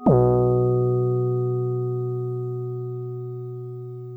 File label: synth 90s low